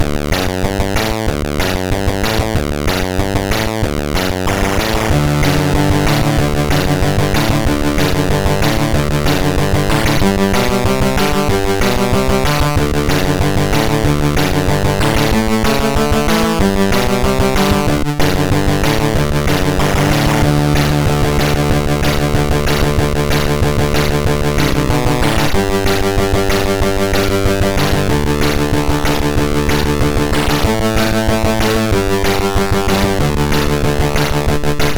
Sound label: sid, MOS6581R2